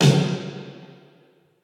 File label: snare; reverb